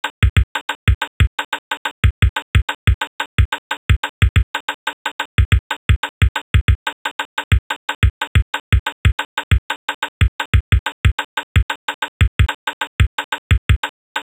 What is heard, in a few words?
post flash beat